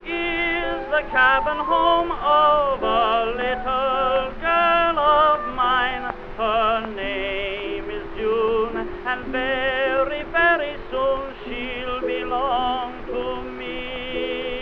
I love the name of Mary tenor and chorus with orchestra; variety stage